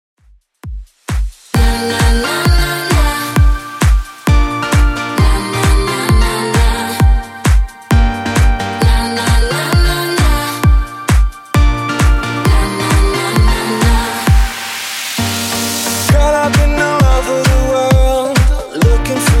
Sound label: Top40 DJ Friendly Redrum 132bpm